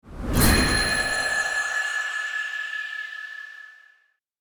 Magic poof sparkle whimsical; fairy

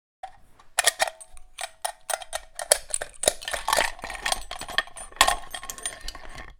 Sniper rifle brass shell foley movement

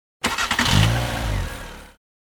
Motorcycle turn on ignition; vehicle

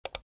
Cute click

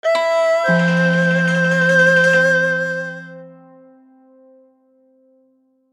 Chinese transition cartoon asian inspired

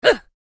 Mobile game character surprise gasp; video game

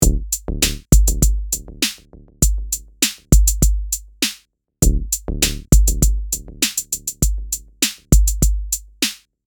E Drum and synth loop

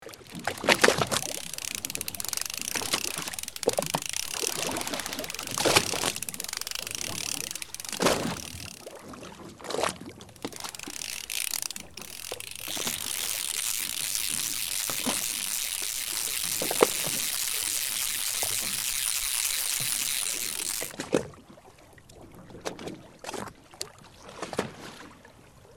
Fly fishing fishing rod reel boat waves ambience

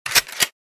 Pistol gun quick reload

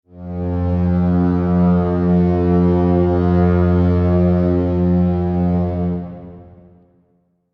Fog horn honk blast ship

